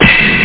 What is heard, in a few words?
jungle; tamporie